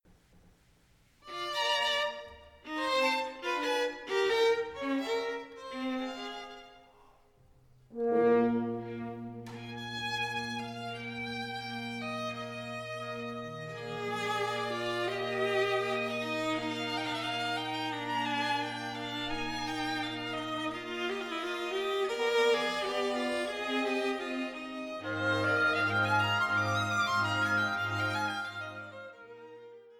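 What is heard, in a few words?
Violin Viola Sinf Con B flat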